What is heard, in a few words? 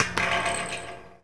Street Ball Metal Rim, Street Basketball; SFX Kit